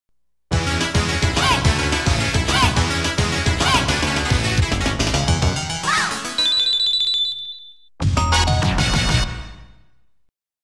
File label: Victory BGM; Player 1; Konami Music Masterpiece Collection